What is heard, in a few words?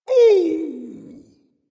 ouch dragon; fafnir